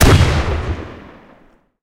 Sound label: cannon 30mm mk103 shot; guns; War Thunder